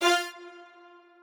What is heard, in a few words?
strings